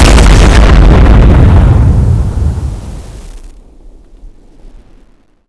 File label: plasmaturret exp; nod